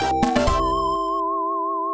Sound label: Character Selected; mega drive; ghostbusters